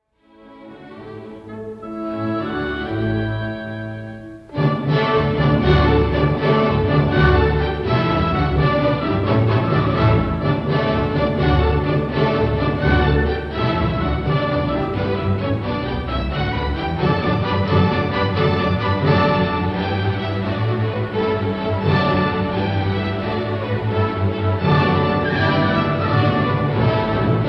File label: Violin Concerto in D major Rondo; Beethoven; Op. 61